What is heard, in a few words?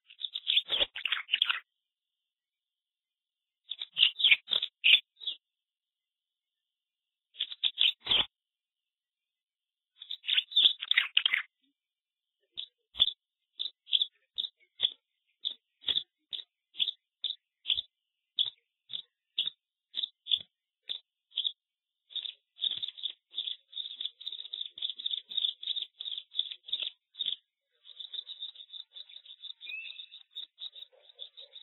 Tree Swallow Song